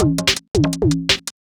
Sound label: Speed Loop, Drums Beats